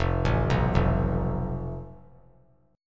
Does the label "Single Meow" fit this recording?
No